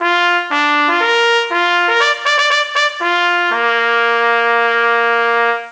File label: Trumpet; kentei kyoukai imasara hito ni wa kikenai otona no joushiki ryoku training ds